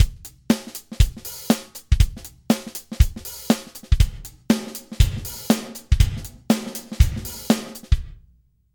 drums gated; exponential audio r2

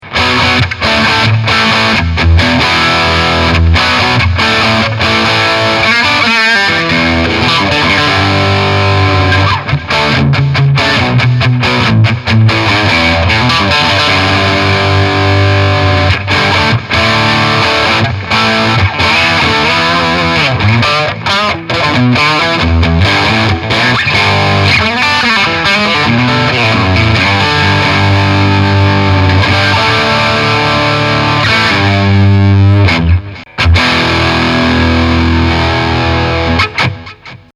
high gain; kong tube fifteen

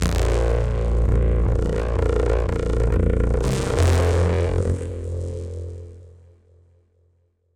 bass paperbox; sidsonic tubes

